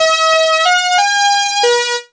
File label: Beat